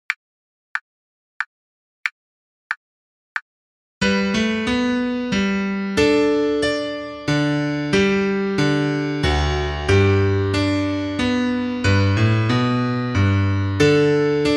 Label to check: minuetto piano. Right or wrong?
Right